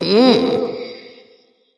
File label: tongue light; smite; voicepack; hel